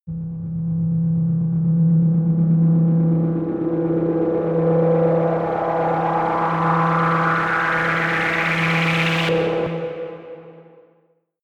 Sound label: rise hum whir low